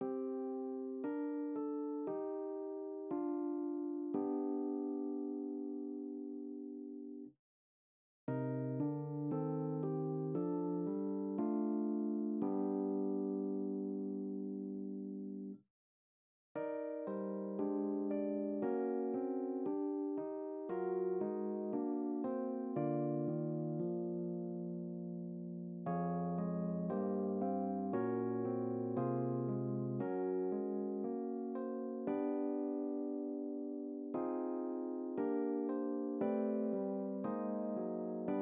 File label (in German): Glockenwerk